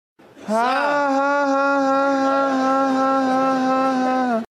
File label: ha ha ha ha haaaa